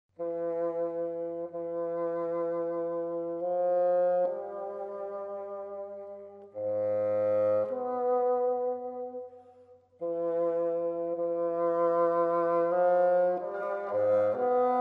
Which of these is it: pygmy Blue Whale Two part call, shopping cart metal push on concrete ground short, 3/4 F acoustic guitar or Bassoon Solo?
Bassoon Solo